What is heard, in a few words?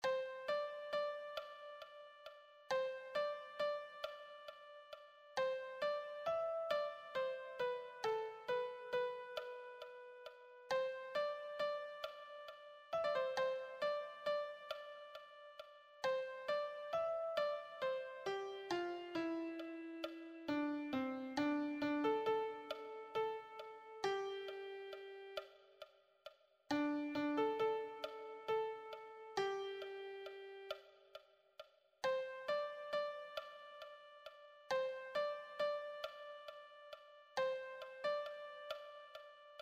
melody